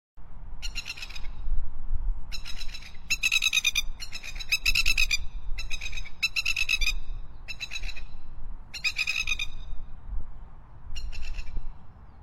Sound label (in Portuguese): Canto da abibe de faces brancas